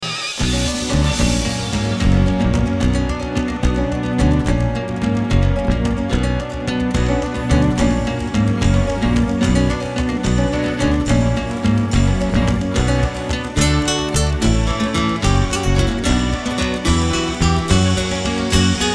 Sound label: Fast Waltz